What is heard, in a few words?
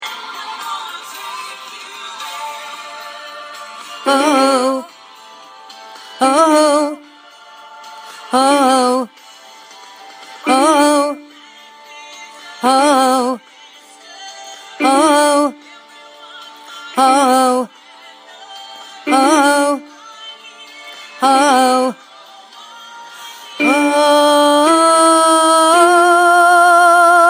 Oooh 3rd below